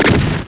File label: FIRE CGUN